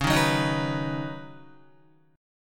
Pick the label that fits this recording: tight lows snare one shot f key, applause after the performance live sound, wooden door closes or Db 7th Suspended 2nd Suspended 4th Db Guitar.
Db 7th Suspended 2nd Suspended 4th Db Guitar